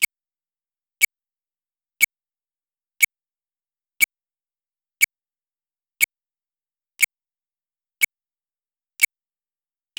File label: soft low pitched, timer